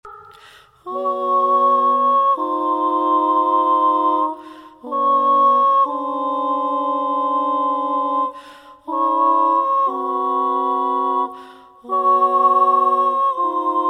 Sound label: Chords original